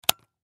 Camera Flash Bulb Close, Digital Olympus Camedia C720; Cameras; Technology; Blastwave